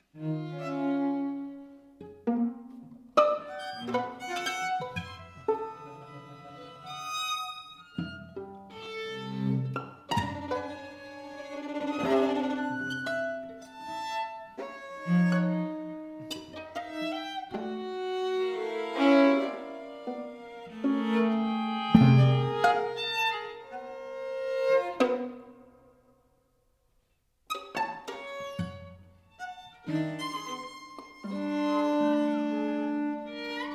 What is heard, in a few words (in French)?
Quatuor; Boulez; Livre Pour Quatuor Révisé